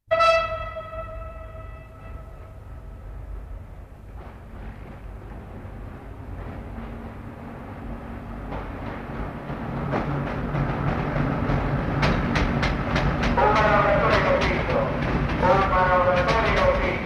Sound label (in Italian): STAZIONE ARRIVO TRENO; trasporti